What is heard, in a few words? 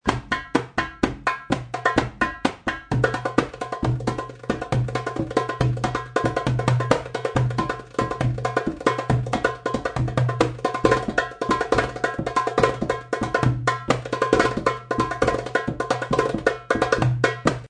tabla solo